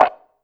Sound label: menu select